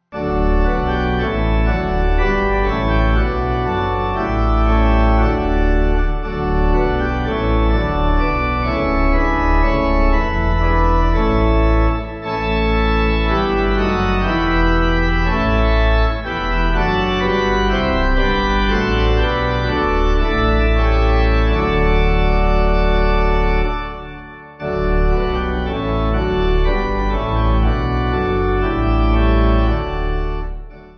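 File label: Pipe organ, church music backing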